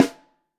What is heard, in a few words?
SN Top, Snare